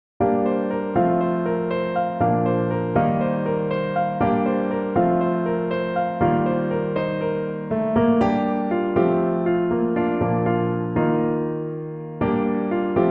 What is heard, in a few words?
I've Got to Find a Way (Piano)